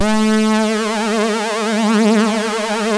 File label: Synth and Organ; InVision Interactive; Keith Emerson